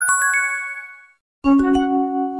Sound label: New Mail